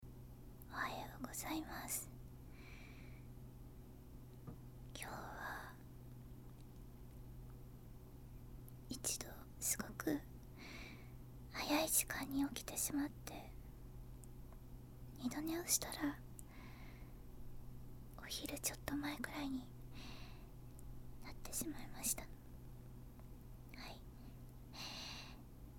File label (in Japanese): 朝起きておしっこへ; 寝起きおしっこ11日分